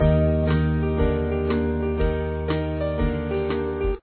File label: Game Win; sonic adventure kiss online